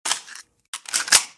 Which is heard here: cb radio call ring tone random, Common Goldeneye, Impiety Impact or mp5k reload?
mp5k reload